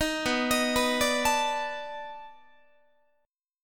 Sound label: B 7th Sharp 9th, down, Guitar; B7#9; arpeggiated; 18,19,18,21,18,20